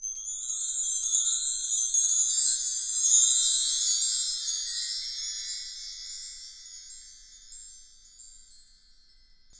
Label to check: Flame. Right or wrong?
Wrong